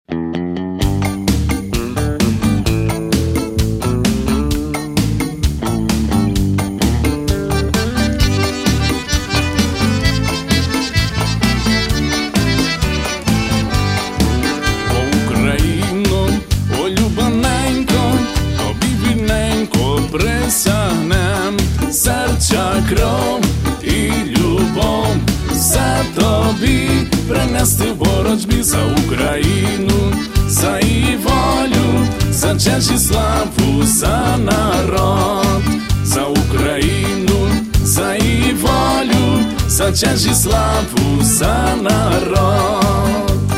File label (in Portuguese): música ucraniana